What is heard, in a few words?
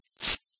velcro tape; Barotrauma; Neurotrauma